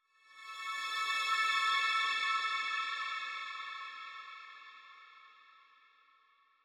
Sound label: Mountain Music, Rhythm Temple Music Success Stinger Louder